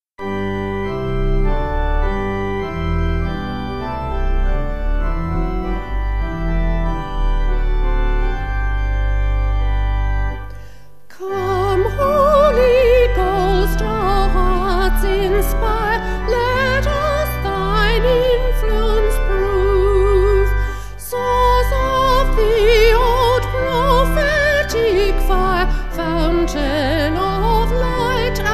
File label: Come Holy Ghost Vocal Organ; St Stephen